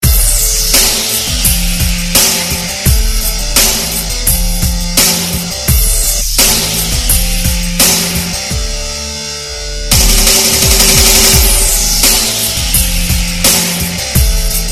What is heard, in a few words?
rock music ringtone